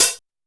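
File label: OPEN HH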